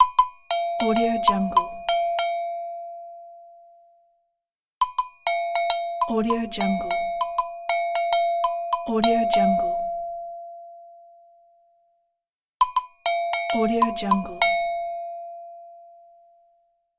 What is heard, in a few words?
agogo bell pattern